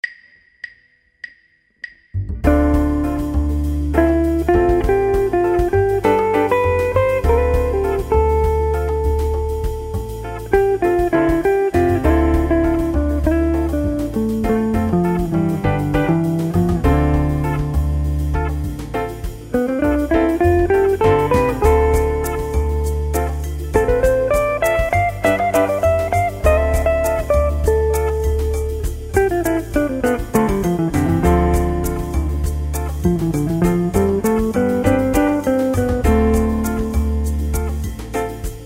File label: Improvising With Dorian Scales Example